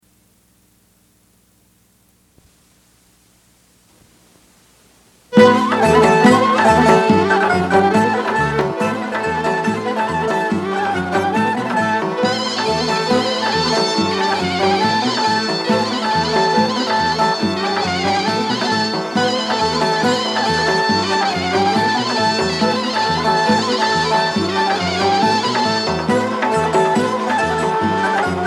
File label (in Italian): Basso